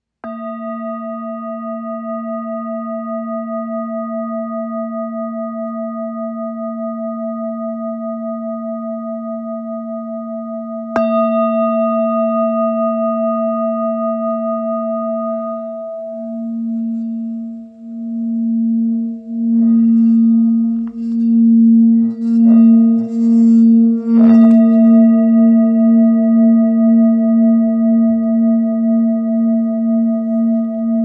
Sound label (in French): 1030gr 190mm 229htz si b